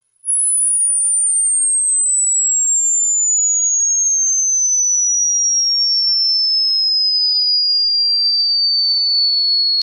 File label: high beep